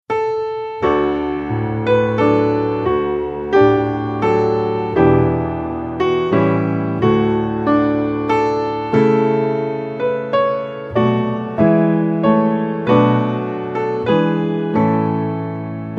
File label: music; thanks